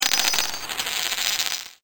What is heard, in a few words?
ALL COINS